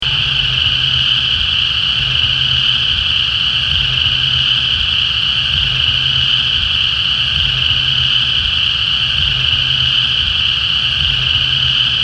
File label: Cane creek cicada